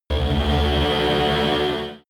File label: extrinsic buzz, energie